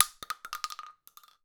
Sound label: Casing Shotgun Shell Hard Surface; The Looker